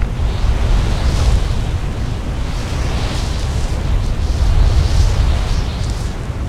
tornado mini; smite; kukulkan

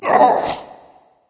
ouch; smite; susano

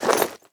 armor heavy; smite; chiron

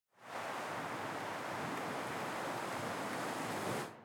Victory Wind; Smite; Susano

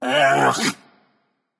"ugh"; smite; janus

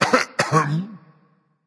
clear throat berserker; cuchulainn

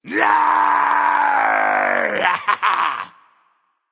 battle cry; smite

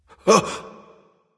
gasp; smite; voicepack; ullr

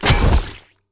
zombie bodyfall; smite; ahpuch skin10

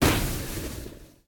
sun impact; smite; khepri

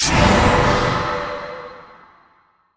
angelic execute; Smite; Thanatos

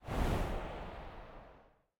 slide; Smite; god Medusa v2

